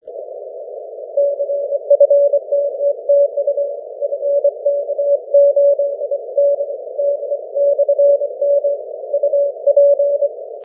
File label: 30m cw